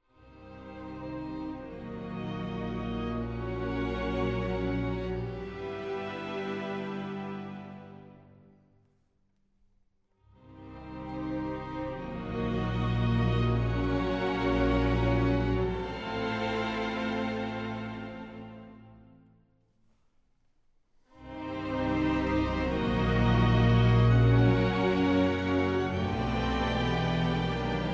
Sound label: Concerto for Horn and Strings, Molto Lento; Bohemian Horn Concertos Havlík, Stich-P